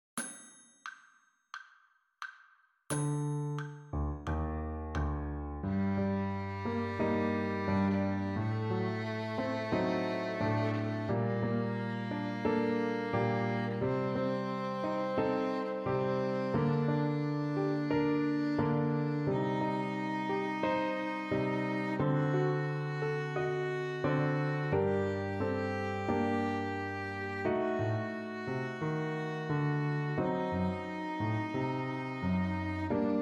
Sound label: g harmonic minor scale